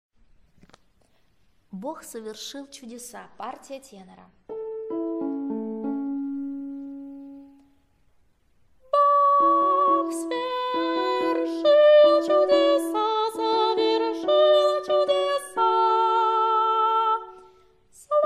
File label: tenor; Bog sovershil chudesa